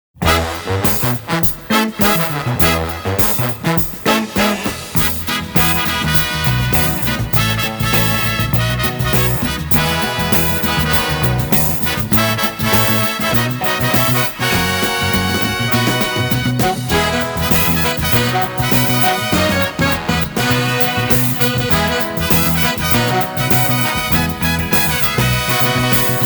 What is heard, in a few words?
Big Band Rock Solo